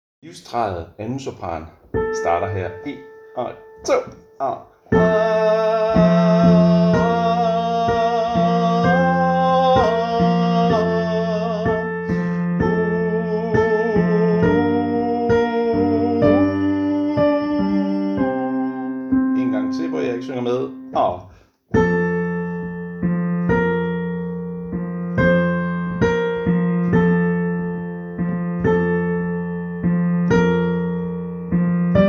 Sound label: 2. sopran